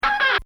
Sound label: Comedy Effect Creak; Funny Sounds; Bobby Cole